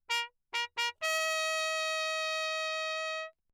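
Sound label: trumpet mute, Crown; CrownAlu; Perinétventiltrompete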